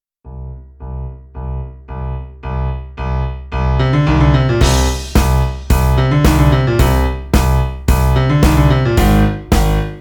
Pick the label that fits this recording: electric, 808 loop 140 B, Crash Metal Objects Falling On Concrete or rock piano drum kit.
rock piano drum kit